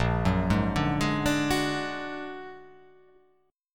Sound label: A sharp Augmented 7th A sharp, guitar